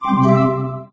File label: message receive; There; ThereClient